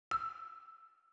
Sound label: Call; Gizmo; interface sounds